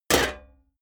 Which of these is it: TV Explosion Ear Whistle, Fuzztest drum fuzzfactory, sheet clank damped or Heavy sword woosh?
sheet clank damped